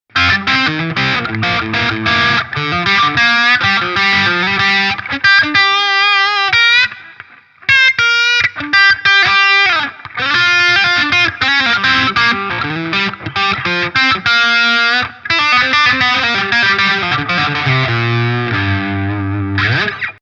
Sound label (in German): KALUNA PRS Steg Gain max.; Vahlbruch